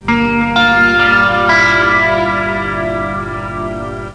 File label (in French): Cloche; Access Control